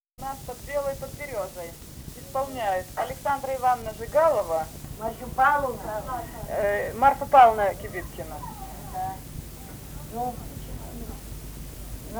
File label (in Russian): карагодная; Песочня; В нас под белою под берёзою